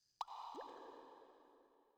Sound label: water drip; Rust